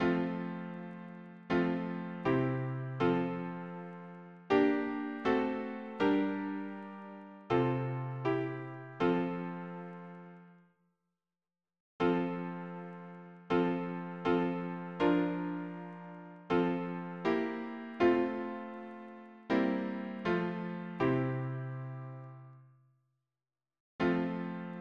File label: harmony piano